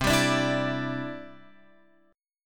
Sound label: C Major Add 9th, C, down, Guitar, Open F; strummed; JGuitar